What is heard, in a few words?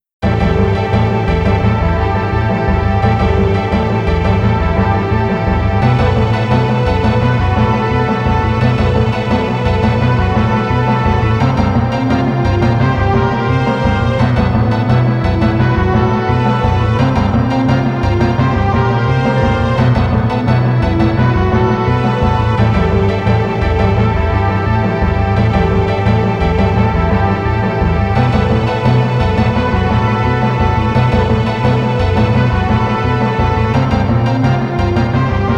Urgent Escape